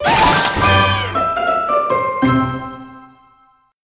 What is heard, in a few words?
Crunchy Finish